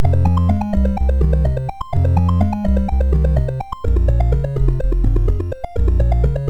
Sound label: sid MOS6581R4